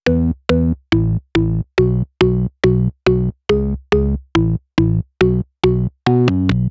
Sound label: Organ, 140 BPM; Vengeance Samples; Vocal-Hook Kits; Song Kit